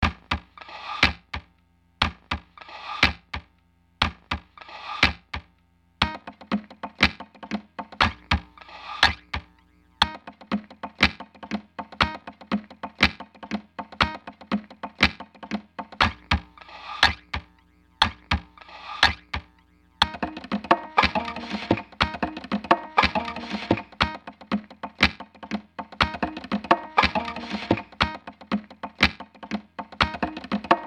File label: acoustic nonsense